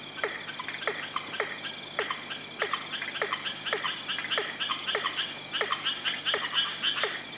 Limnodynastes peroni

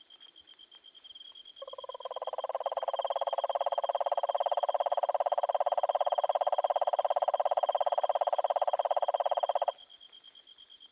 Rhinella marina; Daintree

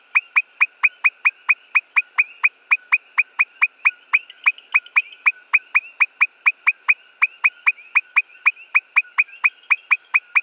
Leptodactylus albilabris; Yunque

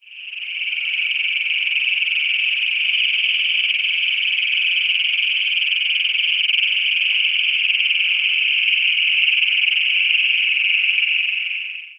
Anaxyrys cognatus; ANURA; AZ

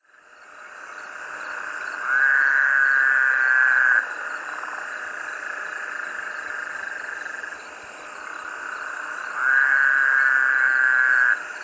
Anaxyrus woodhousii

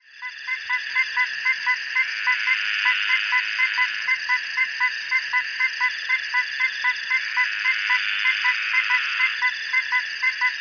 Limnodynastes convexiusculus

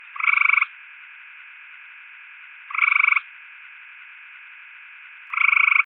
Hyla versicolor; Lavaca

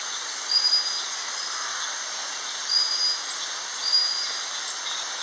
Hyalinobatrachium orientale

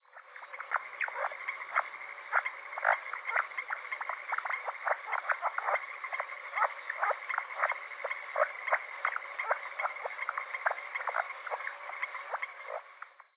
Ranidae Lithobates vaillanti

